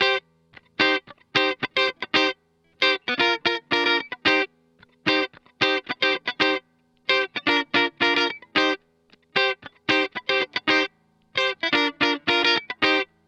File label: 112 C DISCO GTR; 112 BPM DISCO TWO C